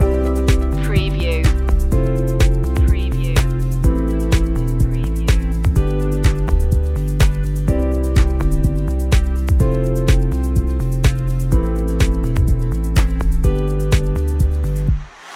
corporate thoughts loop

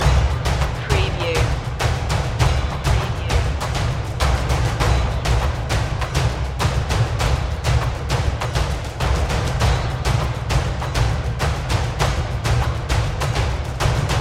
for king and glory perc loop

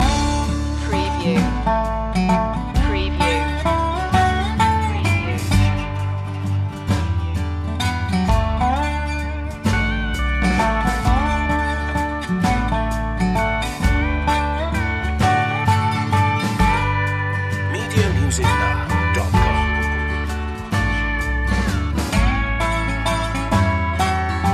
goodnight waltz loop

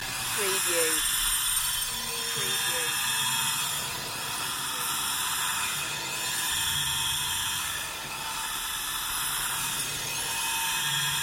hollow dimension pulse loop

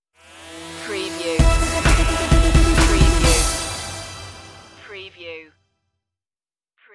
tv stinger

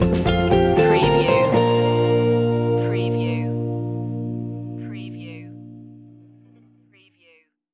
stinger

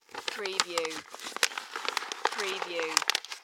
Envelope Manila Hand; Sound Effects Bible

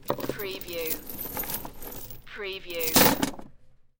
Heavy Chain Rattling Drop Down

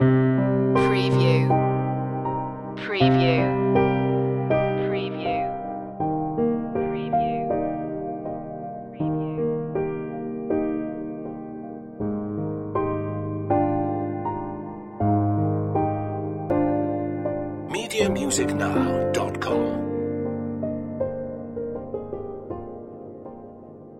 the saddest ballad loop

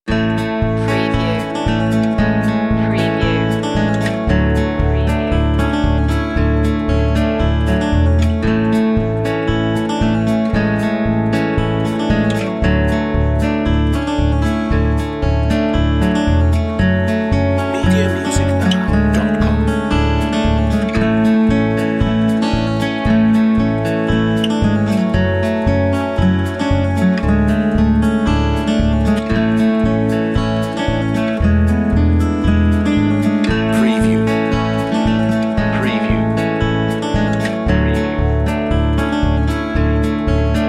homespun guitar

